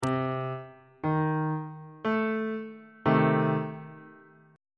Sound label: g double sharp diminished 1st inversion on bass clef